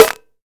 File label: snare drum sound a key, snare sample tuned to the A note. Loudest frequency: 3006Hz